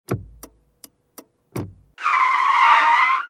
switch, highway; CosmicRage

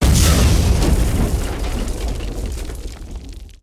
split; CosmicRage

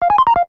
low charge; comms

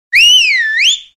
whistle; CosmicRage

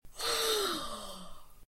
yawn

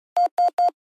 warning

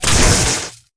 metal; combat; weapons; default shootable

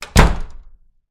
door close

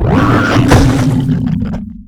die, creatures alien; combat

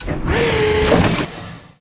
transfer close, doors; general doors

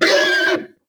hurt, horse

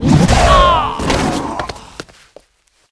hop down; games

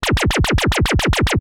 fire; ships

